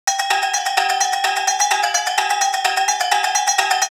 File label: R8 128, drumloops, DrumMachines; X-Static Goldmine; 90 s Sample CDs